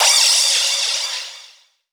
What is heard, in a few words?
Crash, Drums Cymbal